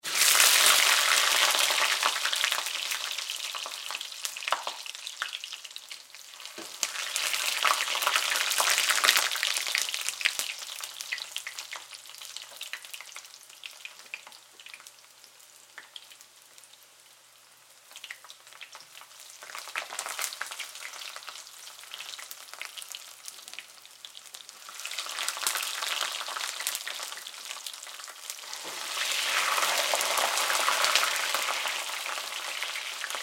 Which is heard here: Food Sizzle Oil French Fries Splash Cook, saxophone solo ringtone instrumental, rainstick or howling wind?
Food Sizzle Oil French Fries Splash Cook